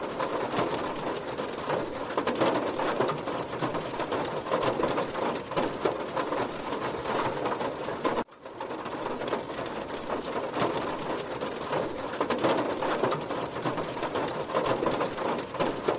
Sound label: rain on car roof